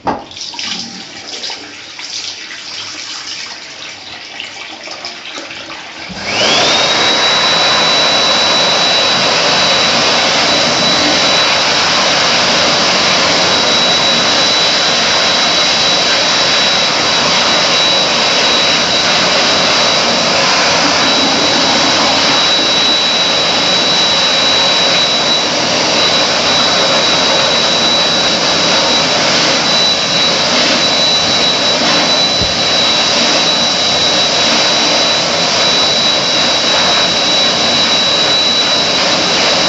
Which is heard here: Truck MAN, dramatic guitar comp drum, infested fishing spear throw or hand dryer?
hand dryer